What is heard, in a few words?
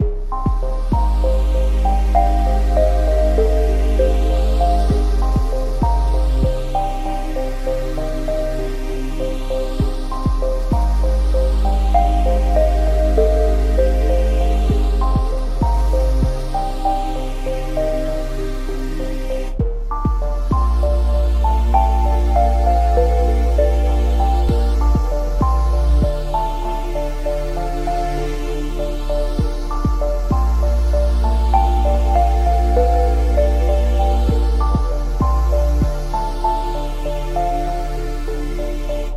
light cold